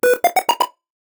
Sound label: interact; DEFENCEBOT